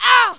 grunt; rashdemo

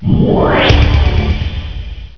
ZAP; The Ultimate Guide to Mortal Kombat

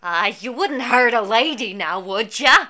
lose game hurt a lady; Deathground 1.2 Demo; New York; red (p2)

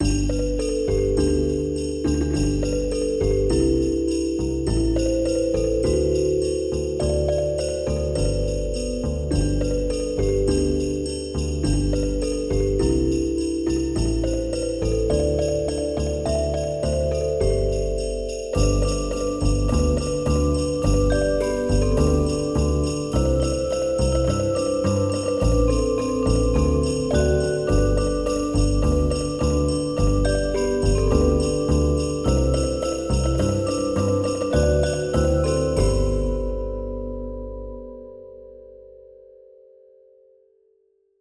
MELODY

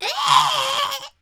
Harpy; Hellboy

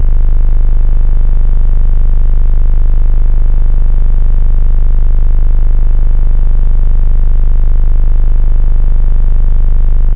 sub base, bass, synth; da capo vol1; instruments

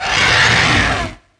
hieracosphinx hit; DAoC; Toa; Gamestar

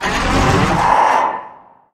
mine cart creak; darwinia demo

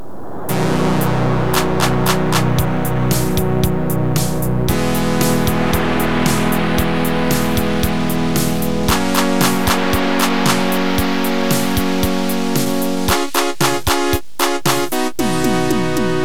chip

